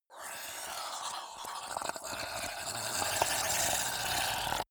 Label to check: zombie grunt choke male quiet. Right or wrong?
Right